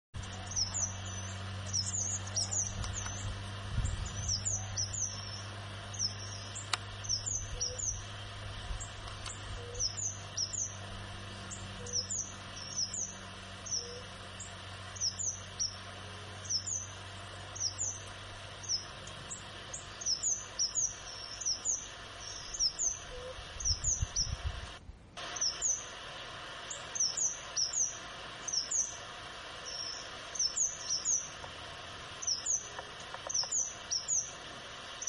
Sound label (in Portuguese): canto do caneleiro de chapeu preto